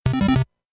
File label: beep 8 bit